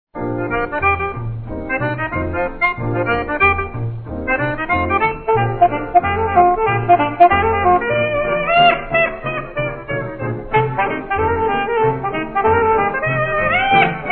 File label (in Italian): Scherzando Col Sax, Solista Sax Contralto